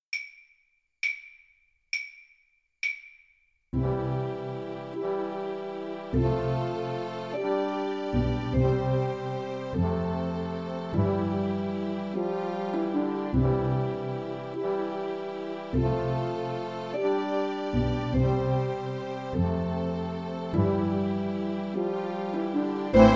skye boat, XYL; Percussion

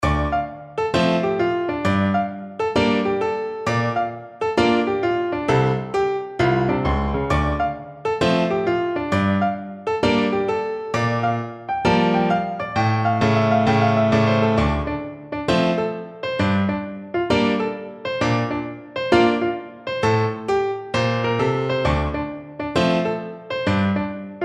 Funk Study

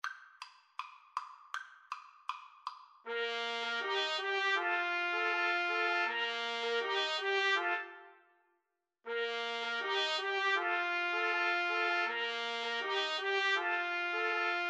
la bamba, Trumpet Trio

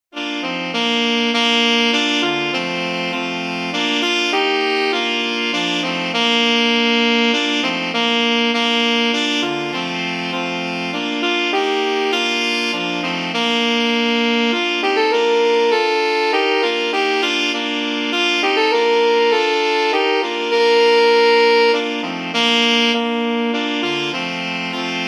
come thou fount, alto sax quartet

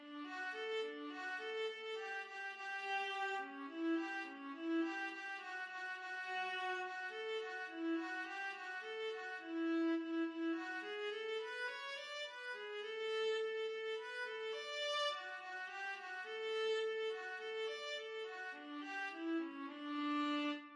pat-a-cake, viola

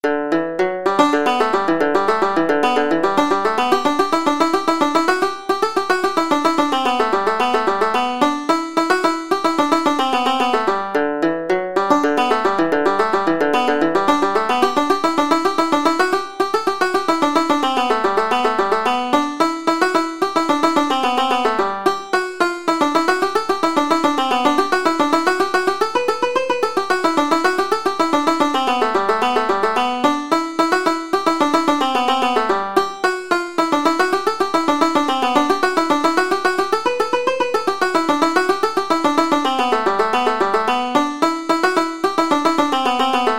banjo

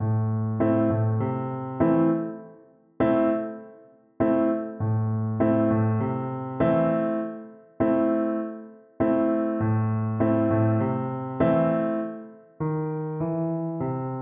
reggae, piano

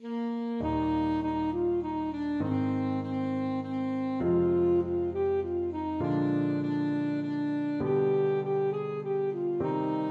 we wish, saxophone

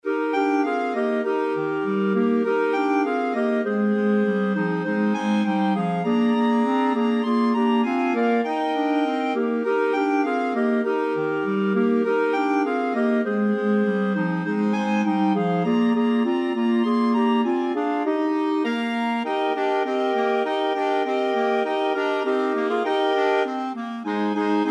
jingle bells, clarinet choir

